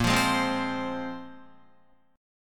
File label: Bb 7th Suspended 2nd Bb, Guitar 4F 4A 5C 5G 5C 6E; Bb7sus2 strummed; JGuitar